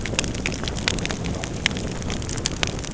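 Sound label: fire loop, disasters; PC Gamer IT CD 38; thief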